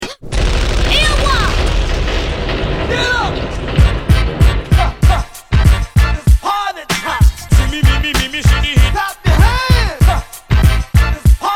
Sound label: Ragga Hip Hop